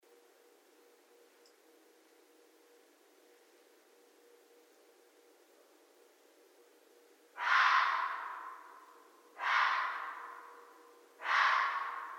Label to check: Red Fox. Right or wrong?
Right